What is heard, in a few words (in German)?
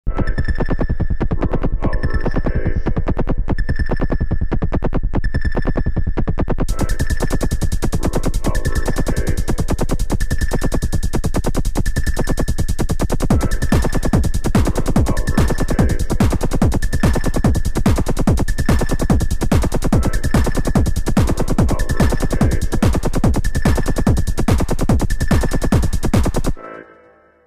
Schranzgemurmel